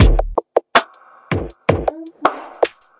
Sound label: 080 bpm sharp verb hit mystery vox, drum loops and breaks